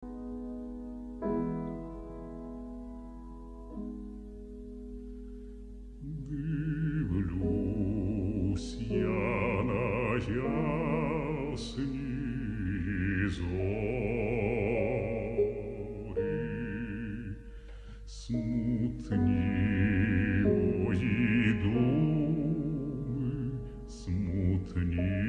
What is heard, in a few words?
ukrainian art song; Kyrylo Stetsenko